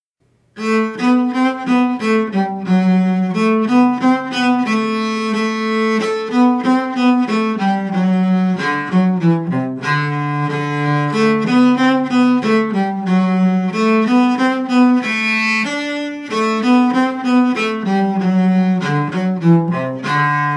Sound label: cello Sound Sample